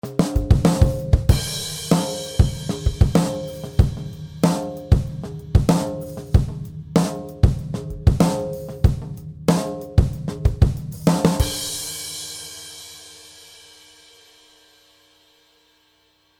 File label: One Kit Tight Old; fxpansion; BFD3